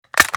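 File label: foley assault rifle akilo47 reload fast mag in; weapons; viper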